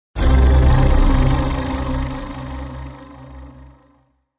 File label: Orchestra Action Sting, Orchestral sting for dramatic or action film scene; Strings; Sound Design